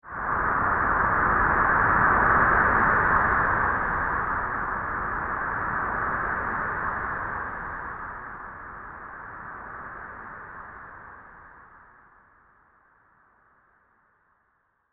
Astral Winds, Science Fiction Sound Effects

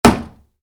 Wood, Light Impact: Plastic; Wood Sound Effects; Wood (Sound Effects:Materials); Stuart Duffield

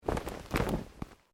Clothing Shirt Cotton Move

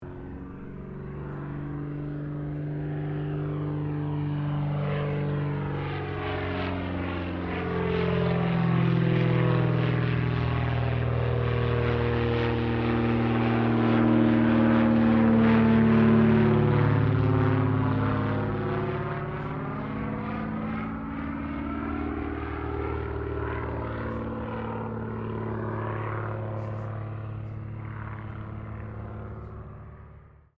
AIRCRAFT CESSNA O-1 BIRDDOG PASS; Cessna Birddog spotter aircraft; Airplanes (Sound Effects:Vehicles)

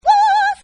Opera Female Voice; Singing

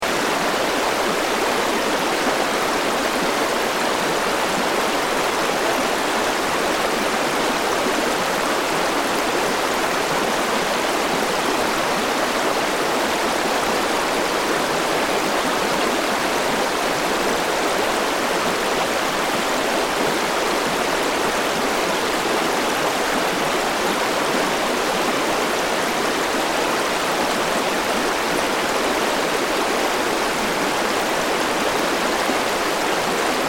Ambience River; Rivers; Nature

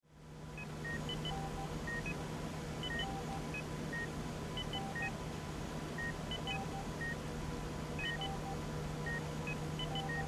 Hospital Hallway Machine Beeps Ambience; Emergency; Hospitals

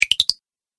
Magic Tokens Game Fx; Video Game Sound Effects

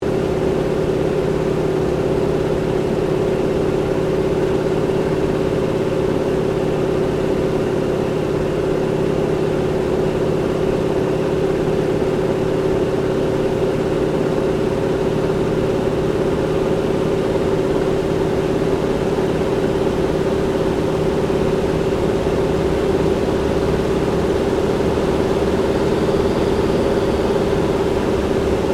AIRCRAFT CA-28 CERES FLYING INTERIOR; Airplanes; Vehicles

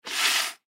Trumpet Spit Valve, Trumpet Spit Valve Blow; Brass; Blastwave

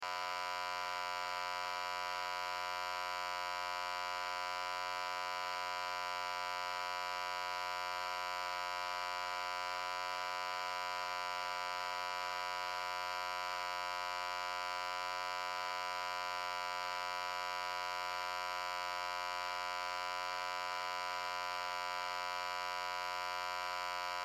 Television Hum Buzz Broken Loop; Blastwave; Technology

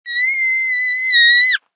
Child Scream, Child screams.; Kid screams.; Screaming; Voice

